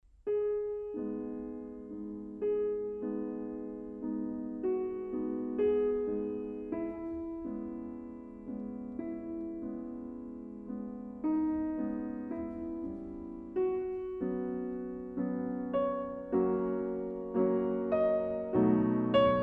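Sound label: minature violin; juon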